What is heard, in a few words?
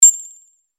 Delayed echo beep